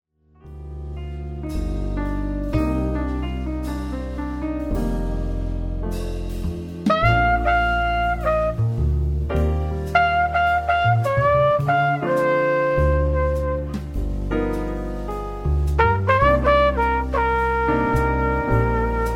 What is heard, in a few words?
jazz sunset